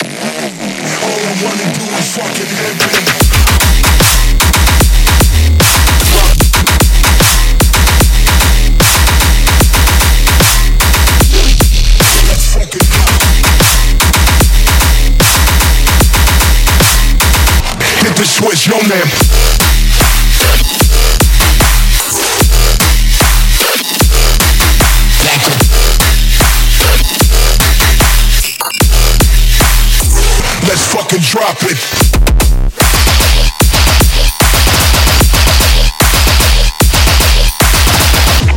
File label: TEAROUT SOUND DESIGN; TEAROUT SOUND DESIGN VOL 1; EDM Templates; EDM Templates Sample Mega 2026